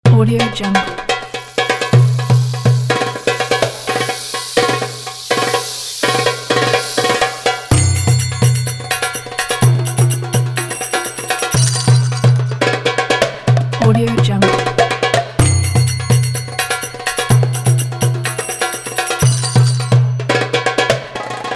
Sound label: belly dance pack